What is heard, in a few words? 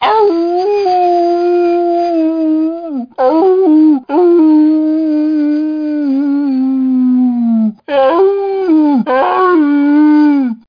dg howl